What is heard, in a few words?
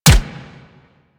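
machine gun shot; Weapons; Archwing Mach Gun B; Warframe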